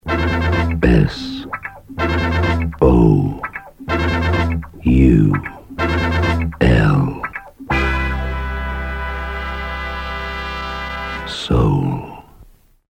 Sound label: S O U L jingle